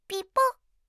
pipo; Windows; Madobe Nanami

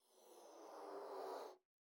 Heart Sound Effect; i OS; iOS10; Messages

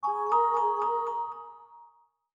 Windows Call Notification

